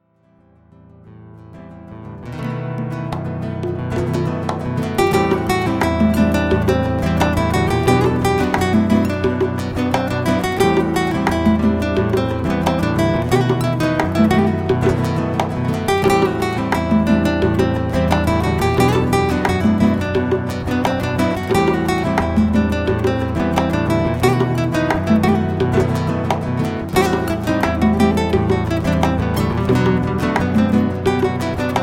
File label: Rumba, flamenco band